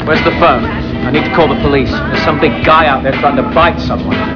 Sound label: police